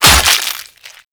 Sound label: Axe Impact Flesh; Warframe; Weapons; Impacts